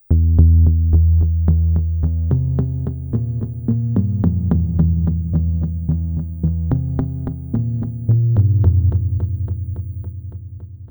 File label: Volca Keys Test Simple Delay